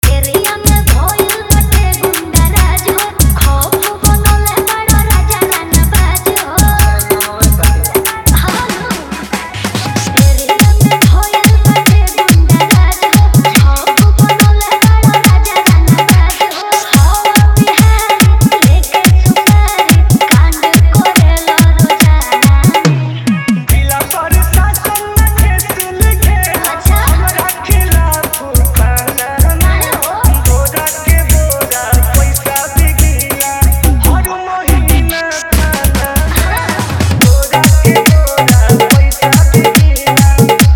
Bhojpuri Hard Dhollki Bass

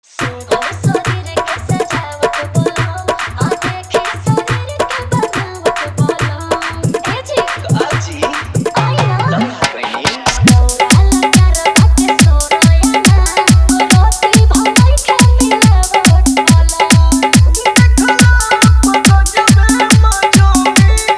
Bhojpuri Dj Song Dhollki Bass

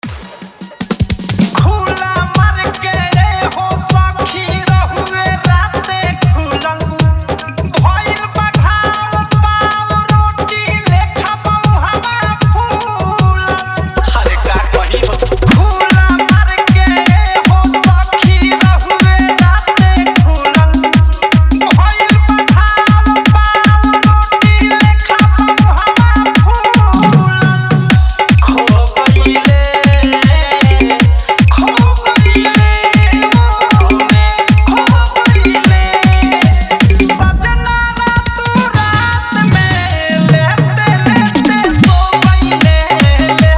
Bhojpuri Dj Song

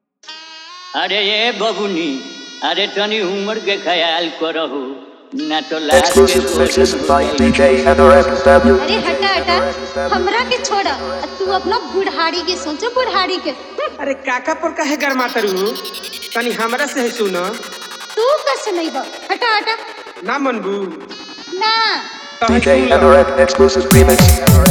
Fully Dhollki Bass Mix

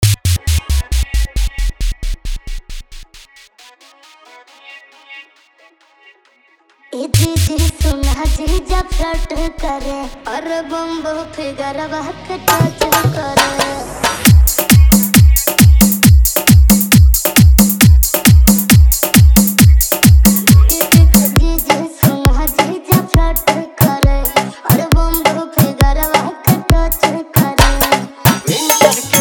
Hard Dhollki Mix

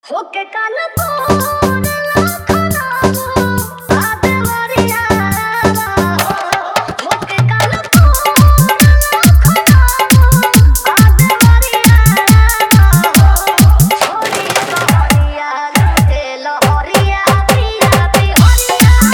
Dhollki Bass Remix

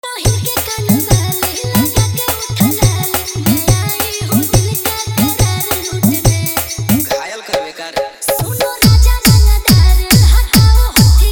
Bhojpuri Hard Bass Mix

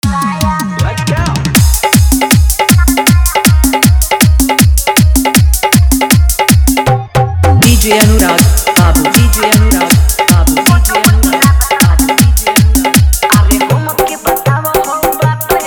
Bhojpuri Dhollki Bass Dance Mix

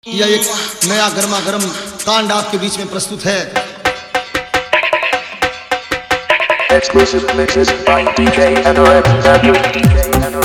Dj Song

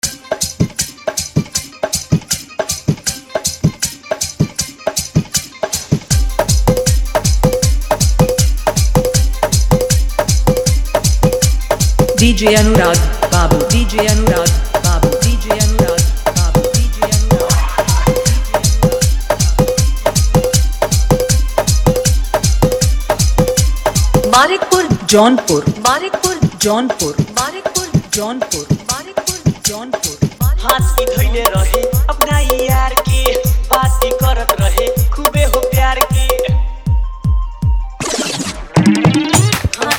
bhojpuri old fully dhollki mix

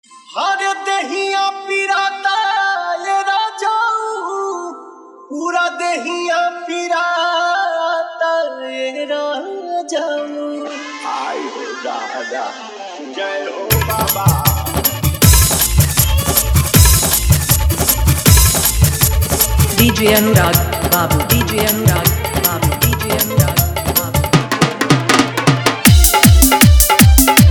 Bol Bum Full Dhollki Bass Dance Mix

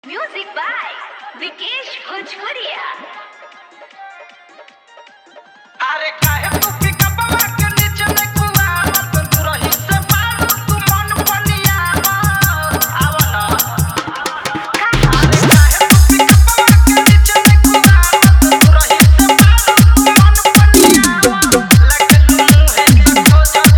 Hard Dhollki Bass Remix

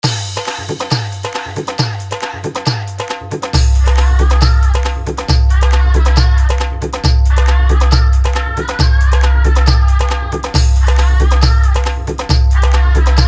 Hindi Full Dhollki Bass Mix

